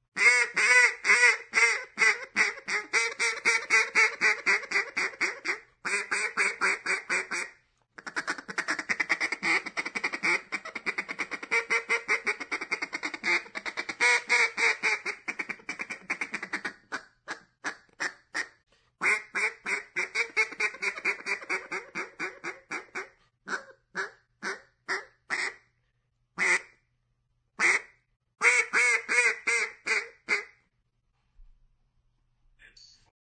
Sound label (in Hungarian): kacsa; vadhivosip